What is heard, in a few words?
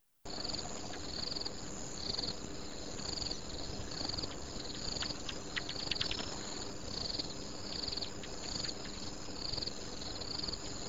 Bat Sounds, pond